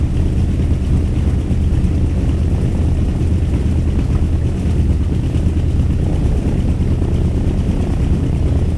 b25 cockpit l, engines; War Thunder

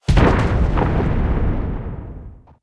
shell explosion; tanks; War Thunder

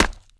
Chop Wood; Civ4 warlords

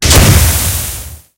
tank hit heat small; tanks; War Thunder

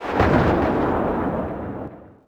carbine 1shot echo

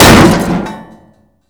Vehicle Engines; Red Orchestra Ostfront 41-45

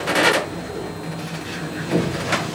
turret; World of Tanks

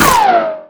ricochet mason; Modern Warfare

